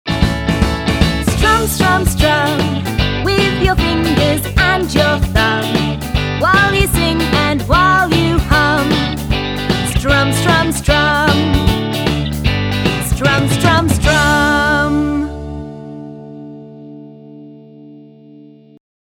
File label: strum